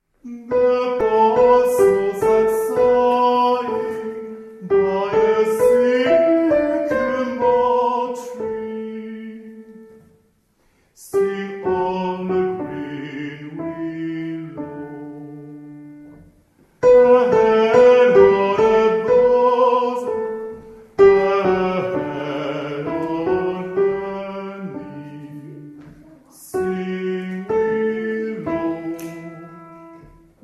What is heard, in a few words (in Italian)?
Soprani; Willow Song